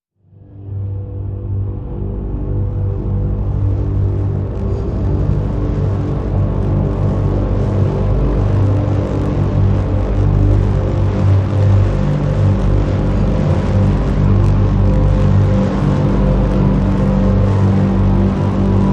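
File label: Drn G, drone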